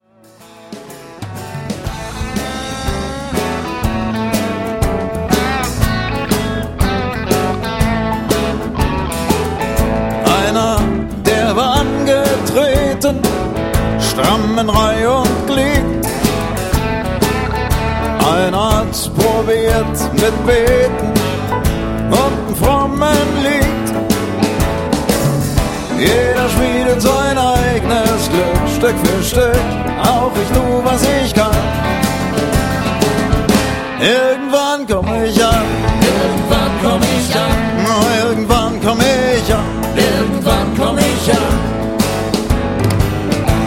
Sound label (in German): schmiede blues